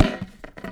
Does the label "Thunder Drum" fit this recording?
Yes